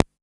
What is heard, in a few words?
Click, classic machines dx